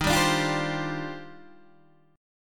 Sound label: D sharp Major 13th, down, Guitar, Open D